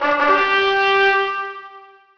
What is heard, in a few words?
Wave Start; luna monsters tower defense the deprived magical kingdom